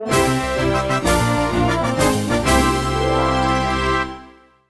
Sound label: Jingle World Complete; Pig Medal; Wii U